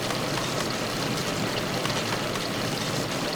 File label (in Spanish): mecanismos fuelle; yesterday origins gamerip 2016 switch ps4 pc ios macos